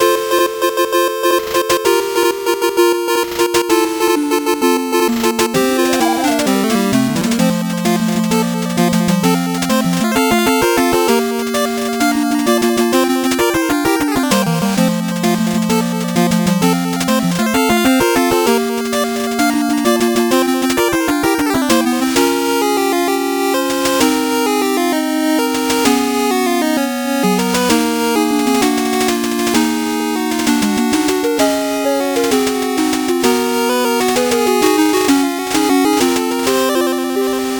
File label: 8bit bgm fast